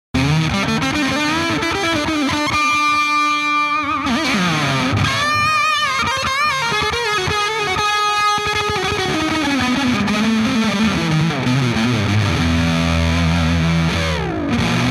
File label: Sunface, Messing around with the Sunface.; Sunface Sound Clip 6